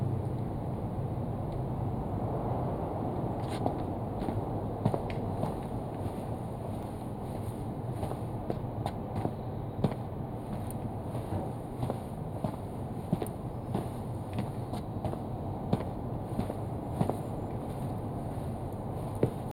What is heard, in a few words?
steps